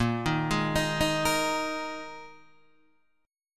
A sharp 7th Suspended 4th down Guitar DADGAD

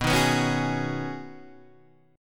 B 6th Add 9th B Guitar DADGAD, chord

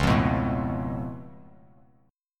Db Augmented 7th Db down Bass Standard; strummed

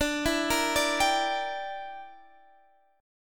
E Minor 7th Flat 5th D

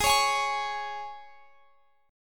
C sharp 5th G sharp

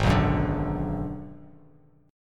Ab Minor 7th Flat 5th, Bass Standard; strummed; JGuitar

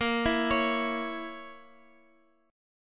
Bb Diminished Bb Guitar Open F; arpeggiated; JGuitar

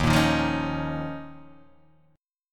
Eb 7th Suspended 2nd Eb; strummed; chord

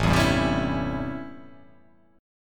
A 7th Sharp 9th; A7#9; strummed; JGuitar

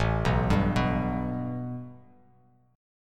A 7th Suspended 2nd; A7sus2 arpeggiated; JGuitar

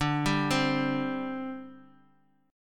D 7th Suspended 4th, down; arpeggiated; JGuitar

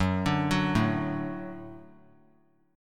Gb Minor Sharp 5th Gb; arpeggiated; JGuitar

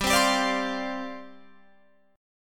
G 7th Suspended 4th G down Guitar Open F

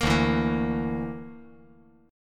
D Suspended 2nd Sharp 5th D

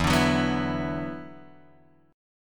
E 7th Suspended 2nd, down, Guitar, Drop D

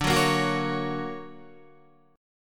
D Augmented Major 7th down Guitar; D+M7 strummed; JGuitar

